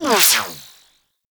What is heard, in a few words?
Vomvalyst Laser Whizzby; Warframe; Teralyst